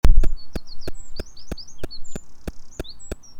Troglodytes troglodytes; SETE

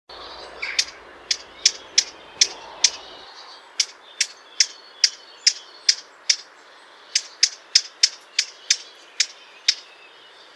Sylvia atricapilla; SETE

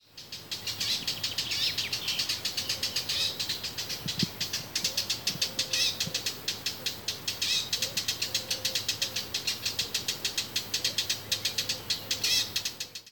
Sylvia atricapilla; SETE

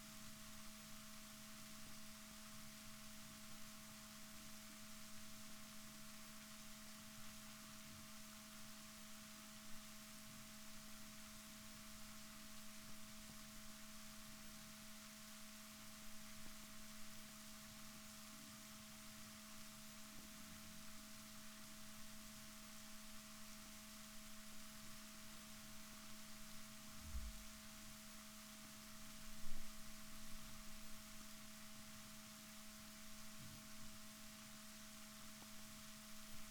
rorquals positif, Balaenoptera Physalus Chile

